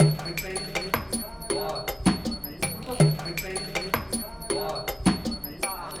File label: Liquid Space